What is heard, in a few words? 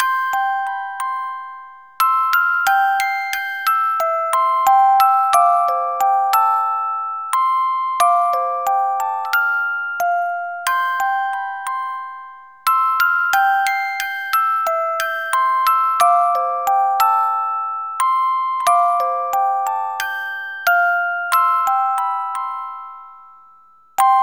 Music Box Mix